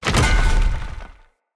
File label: Ground Impact, Swords; Sub Boss; Weapons; Enemy; Mockery Swords; Stultion